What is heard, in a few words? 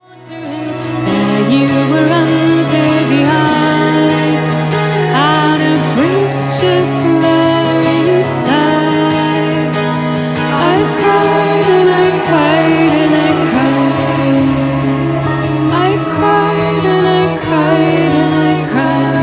Lap steel sample